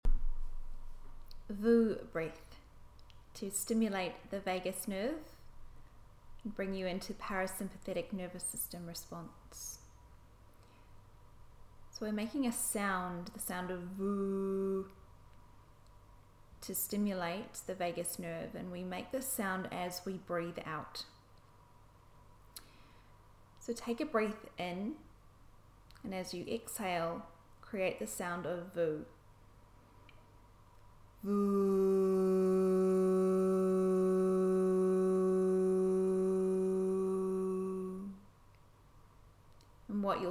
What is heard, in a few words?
breath